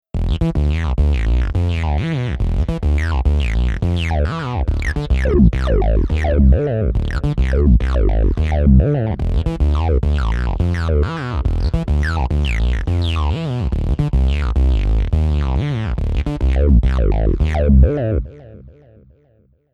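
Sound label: synth saw dist bass; V Synth XT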